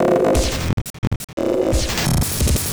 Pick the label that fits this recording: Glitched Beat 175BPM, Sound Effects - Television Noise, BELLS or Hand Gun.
Glitched Beat 175BPM